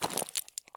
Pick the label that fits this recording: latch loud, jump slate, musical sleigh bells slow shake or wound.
jump slate